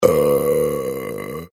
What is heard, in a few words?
human burp male; Burping; Burp (Male) 1; McKinney Sound